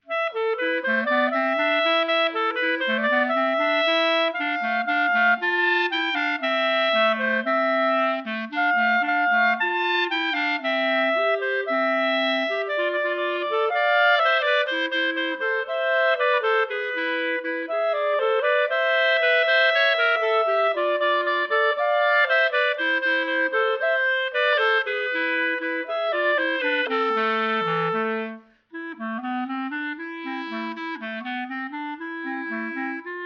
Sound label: klezmer